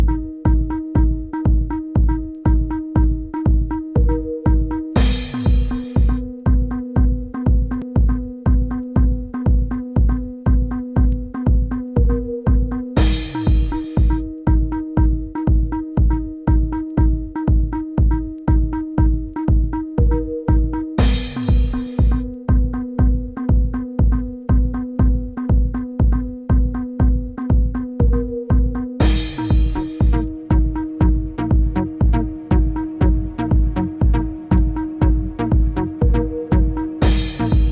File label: instrumental mix